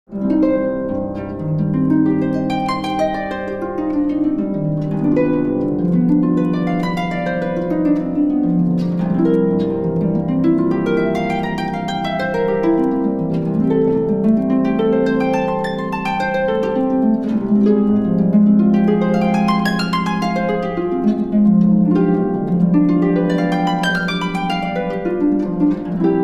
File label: Harp